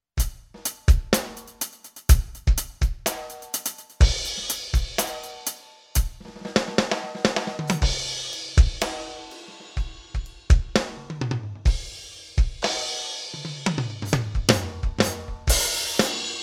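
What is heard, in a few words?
Alesis Crimson II Kit, drums; Yamoc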